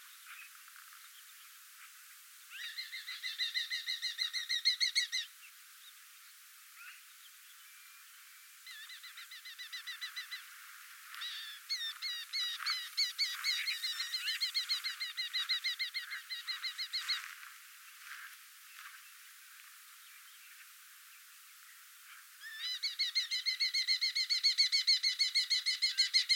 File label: Falco amurensis; Amoerroodpootvalk; roofvogels; Falconidae; Falconae